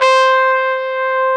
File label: TRUMP C4, Synth; TRUMPET